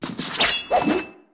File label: sword